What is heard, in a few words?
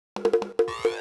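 175 drum and bass Perc Mix; Dance DJ; Percussion; Misc Perc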